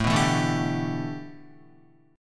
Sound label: A 7th Suspended 2nd Suspended 4th, Guitar, A7sus2sus4 chord; A7sus2sus4 strummed